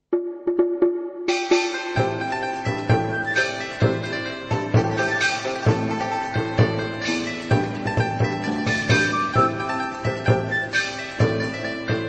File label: Music Normal